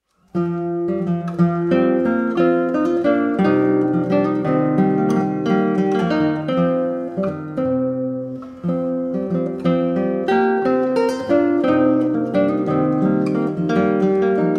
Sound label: 2 guitars